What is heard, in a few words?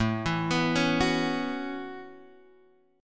A 6th Add 9th, Guitar, Open G